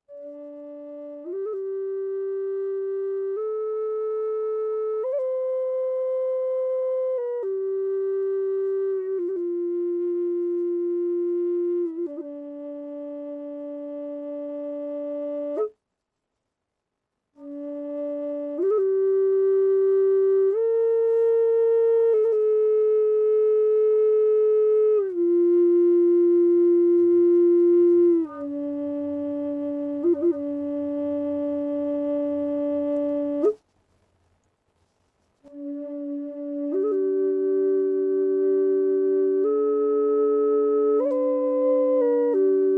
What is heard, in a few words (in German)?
D 440 zeder ohne hall, indianerfloete